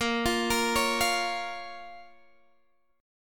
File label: A sharp Minor, down, Guitar, DADGAD